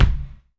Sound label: Kick (process), Live Kit; Sonic Foundry (Sony Creative Software); Ugly Remnants VOL-2; Single Shots; Beatstuff